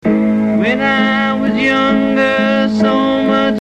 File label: Piano A